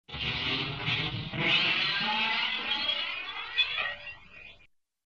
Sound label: Glass shattering in slow motion